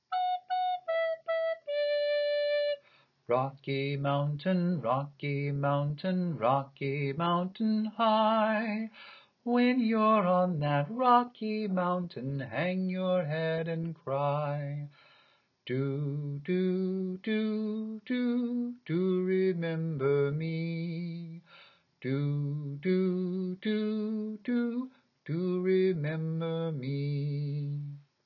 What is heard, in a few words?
Rocky Mountain voice